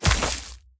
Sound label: Web Spawn; The Inn